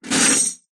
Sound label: keys large bunch prison guard take out of metal security box